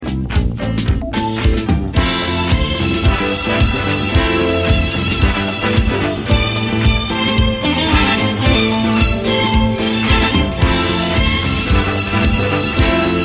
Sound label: Instrumental